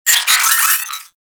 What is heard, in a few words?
space drone pain; Warframe; Corpus Space Drone